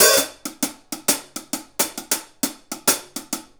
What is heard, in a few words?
Hi Hat Pattern